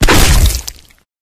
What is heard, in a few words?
bodyfall combat form; flood